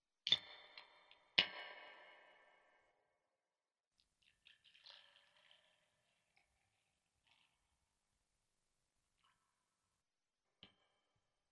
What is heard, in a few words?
Guitar and Bass Song